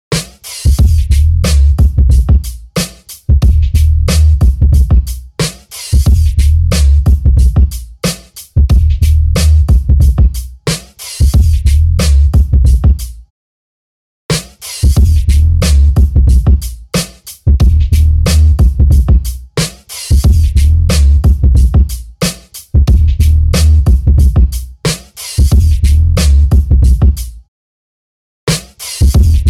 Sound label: Oldskoolish 90bpm Ish; Nikolat